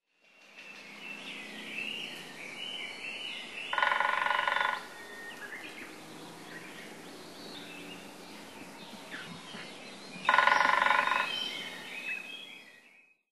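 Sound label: aogera, Japanese Green Woodpecker; miyagase; koe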